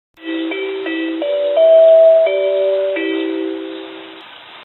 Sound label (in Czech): znělka; Nezamyslice